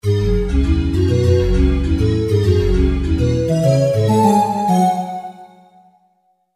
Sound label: Pad